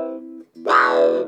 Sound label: WOW, Funk Guitar; 90 s Sample CDs; Zero G